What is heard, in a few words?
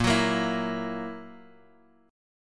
E Suspended 2nd Flat 5th Bb